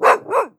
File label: Dog Bark